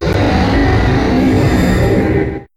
Grito de Guzzlord

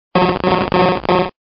Grito de Krabby; Pokémon

